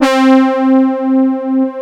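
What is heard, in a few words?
OBX BRASS, Oberheim; USB Soundscan vol.49 - Analog Fantasies; AKAI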